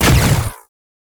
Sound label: Rifle Shot, Weapons; Warframe; Tenno; Gyre